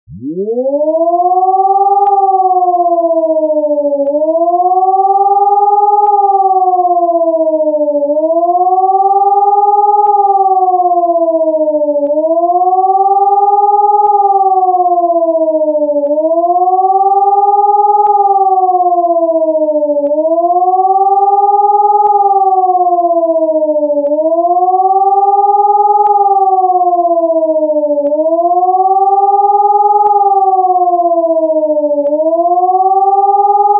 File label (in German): Sirene Warnton